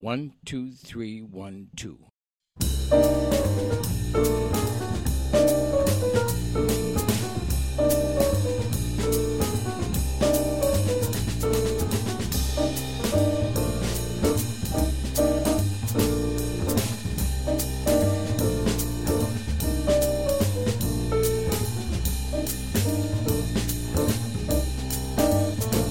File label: minus melody